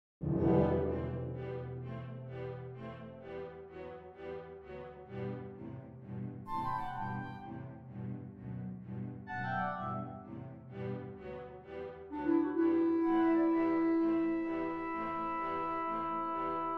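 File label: symphony 2nd movement start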